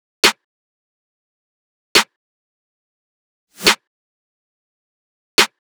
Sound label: Riddim Drum Loop 140 Snare; Ghosthack; Riddim Essentials Volume 2; Full Drum Loops